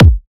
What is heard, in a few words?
original bass drum sample e key, kickdrum sound tuned to the E note; kick drum samples